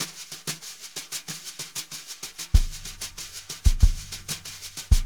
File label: BRUSH LOOPS